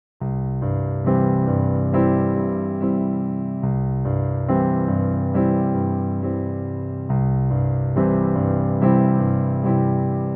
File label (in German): Klavier Solo